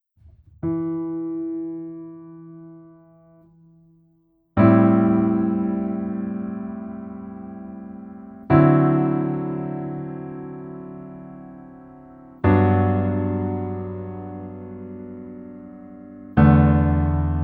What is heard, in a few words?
Piano ver